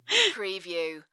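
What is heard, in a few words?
Female Gasp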